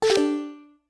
Options Cancel

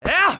Jump Attack; Link

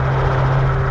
Spinner Loop

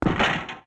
Magic Armor Step

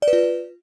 Save Menu Save No

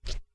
Boot Lift

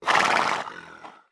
Bray; Epona

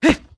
Side Jump; Link

